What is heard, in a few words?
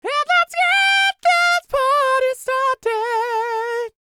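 falsetto, R&B Dance; Spectrasonics Vocal Planet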